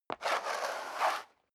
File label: step leather shoes concrete slide long; schritte